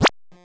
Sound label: enemy shoot; Station-0